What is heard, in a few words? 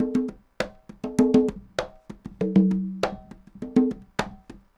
conga 100, latin, 100bpm; Sound Effect Library